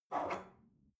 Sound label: espresso grab from table medium, restaurant cafe